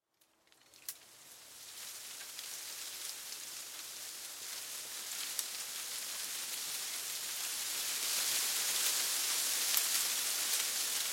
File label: Leaves Small Leaf Wind Moves Rustles Snaps; Weather